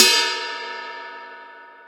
ride cymbal sample B key, ride cymbal drum sound tuned to the B note